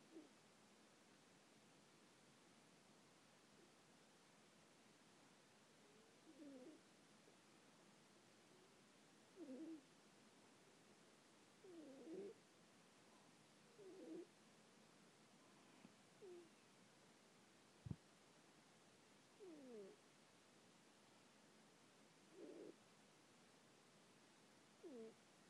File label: snoring cats very low volume